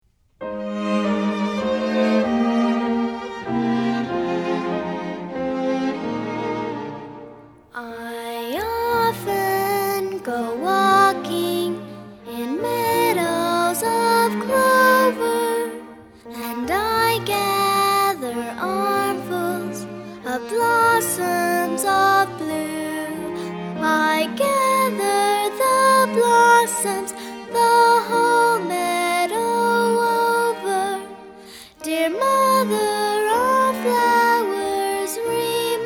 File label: i often go walking words and music